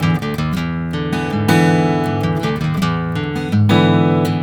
BARI RIFF, Blues Guitar; ILIO; Fretworks; 108 BARI RIFF

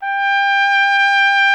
BRS TP G 0, Trumpet; Miroslav Vitous

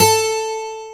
ACOGTR

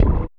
Sweep Kick; Sonic Foundry (Sony Creative Software); Esoterik Beatz; Sweep Kit

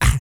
GRUNT, MALE HUMAN; Spectrasonics Vocal Planet

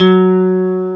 GUI STRATO, E-Guitar; E-GUITARS

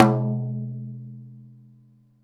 TOM, Drum Dry; East Collexion; Drum 1

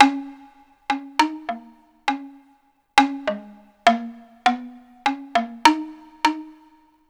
Boo Melody; Ambient; Melodic; Ambient-Melodics

